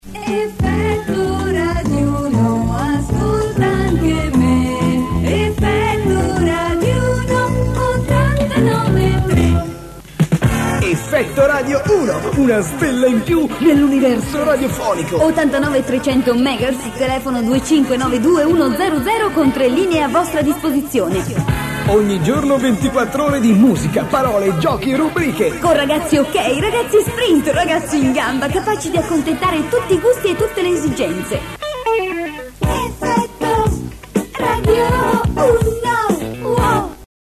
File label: radio jingle; effetto radio1